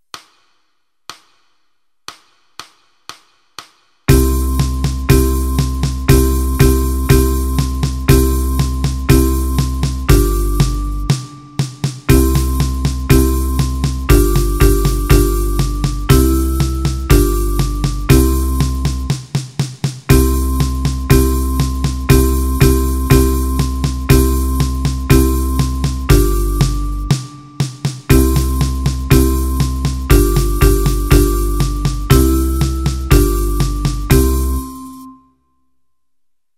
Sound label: 120 bpm; die parade; einfache stuecke